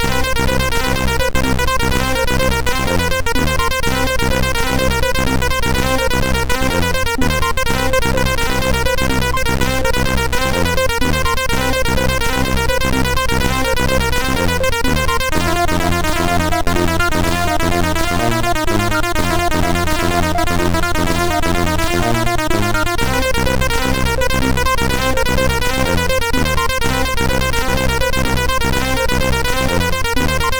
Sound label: Blues, MOS6581R3